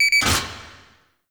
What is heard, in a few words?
Railjack Weapon Power Ready Two; Warframe; RailJack Weapons; Modular Weapons